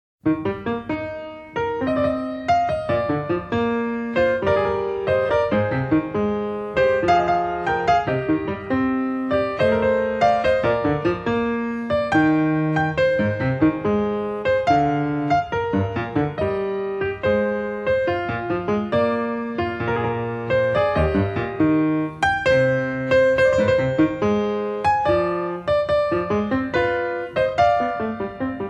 Three-Part Invention (Sinfonia) in E Flat Major; Bach; No. 5; BWV 791

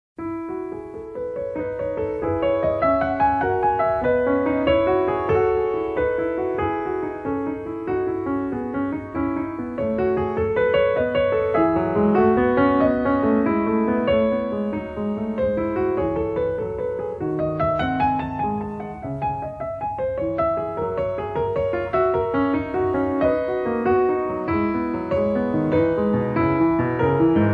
Three-Part Invention (Sinfonia) in E Major; Bach; No. 6; BWV 792

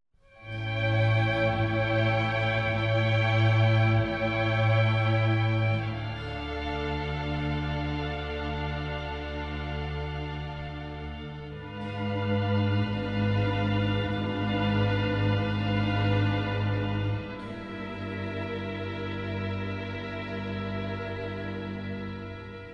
Concerto for 2 Trumpets Largo; Antonio Vivaldi; Klasika

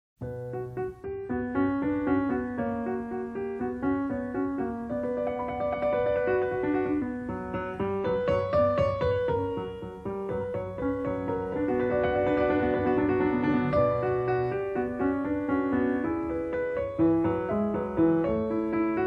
Three-Part Invention (Sinfonia) in B Minor; Bach; No. 15; BWV 801

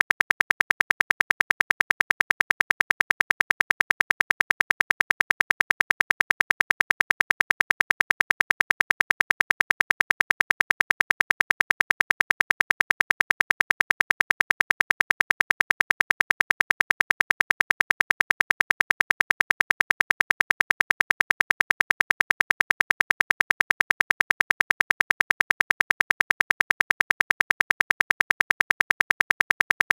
Chirp; ORL-přednášky, semináře

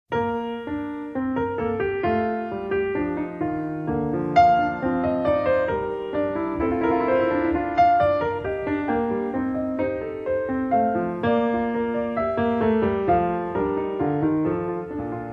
Three-Part Invention (Sinfonia) in B Flat Major; Bach; No. 14; BWV 800

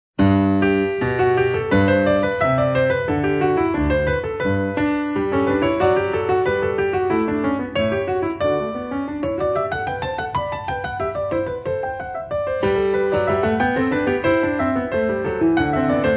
Three-Part Invention (Sinfonia) in G Major; Bach; No. 10; BWV 796

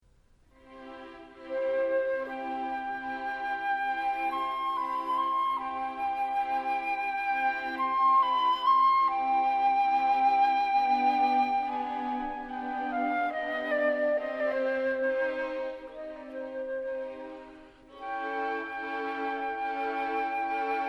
Flute concerto Largo; La Notte; Antonio Vivaldi; Klasika

